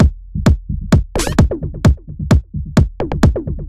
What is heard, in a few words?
Beat 130BPM (Perc Kick), techno; techno drum fx